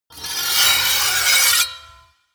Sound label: scrape